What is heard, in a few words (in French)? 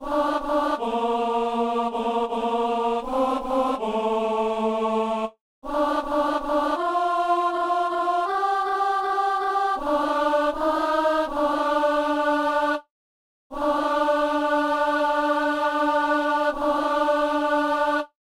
psaume, alto